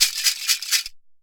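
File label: maraka groove; Best Service; World Colours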